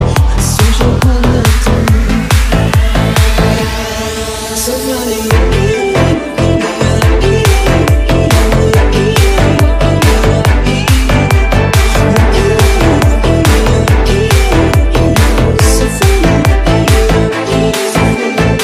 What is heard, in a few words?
remix